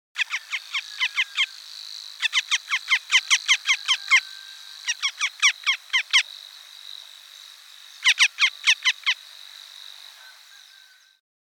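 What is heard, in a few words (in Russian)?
bolotnyy lun golos, marsh harrier voice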